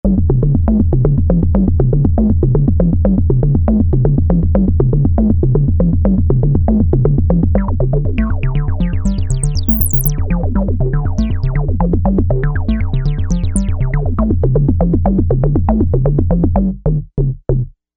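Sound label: Dark Percussion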